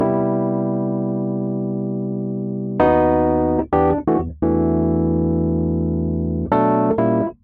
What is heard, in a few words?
c funky house electric piano loop part a 129 bpm